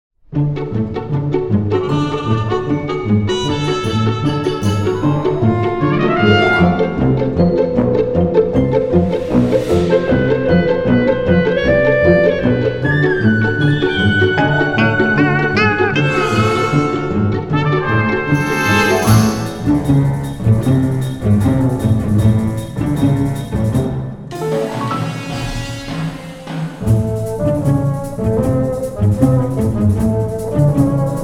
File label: menu music; the simpsons game official soundtrack 2007